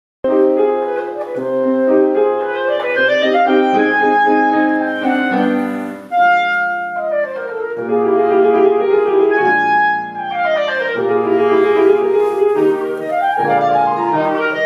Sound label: clarinet piano sonata